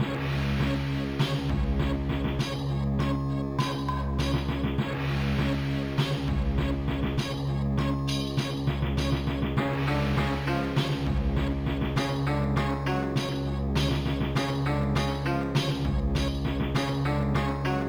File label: Opening Loop; Vasara Collection